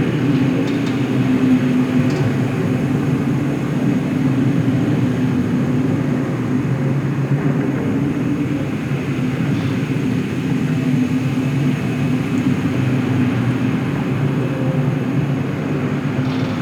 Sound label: Ocean Interior Ambience; Warframe; Grineer Ocean